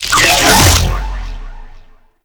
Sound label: Portal Close; Enemies; Sentient Dolicholyst